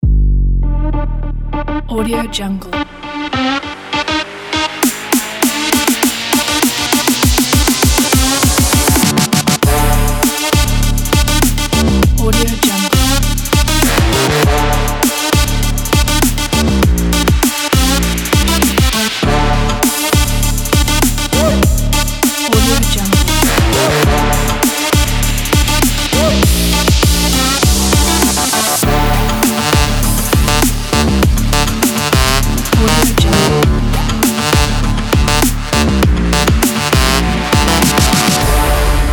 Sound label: at this trap beat